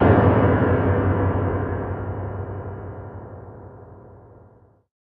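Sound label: shock sound; misao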